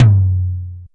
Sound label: Oberheim DX tom low